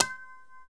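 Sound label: percussion tabla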